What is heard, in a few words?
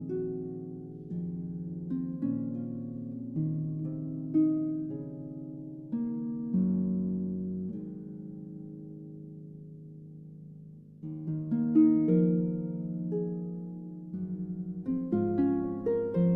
Harp Concerto Lullaby